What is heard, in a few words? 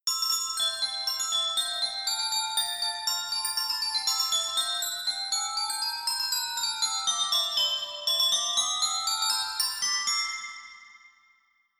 Glockenspiel